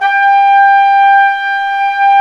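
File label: WND, Hybrid Winds, Flute & Flugel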